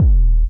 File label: Kick Bass